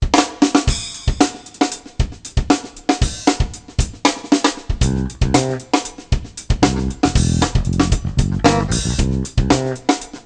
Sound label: Joy, happymusic; 特酷声音素材库; 背景音乐